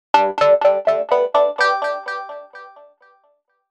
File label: synth up